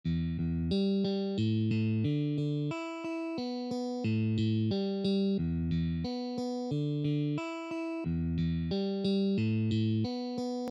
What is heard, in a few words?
Guitar exercises